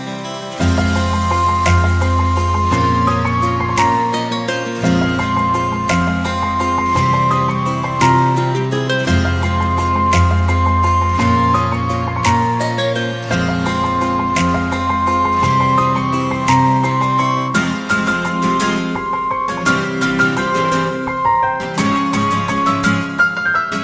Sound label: instrumental